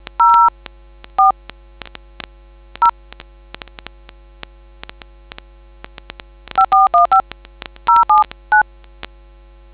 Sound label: dtmf